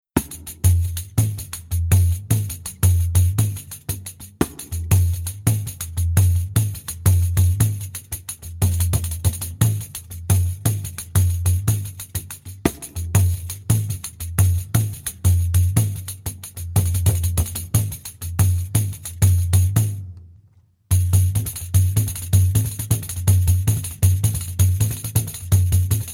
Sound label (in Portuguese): ritmos